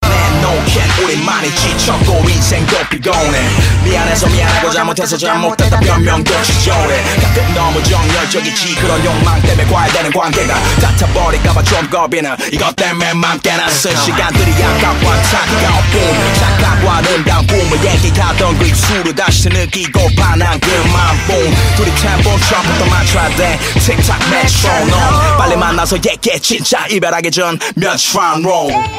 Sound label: Metronome